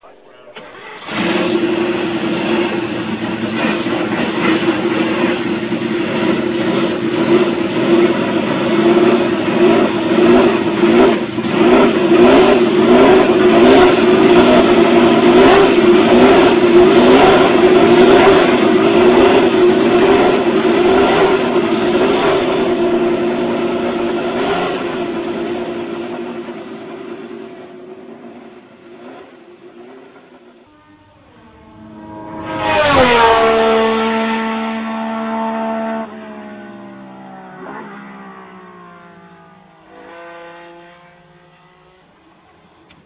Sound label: Ferrari 312t3, motorhangok; autosport